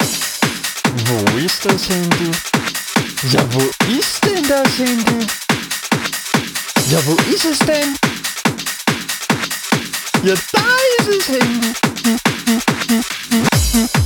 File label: orghardstyle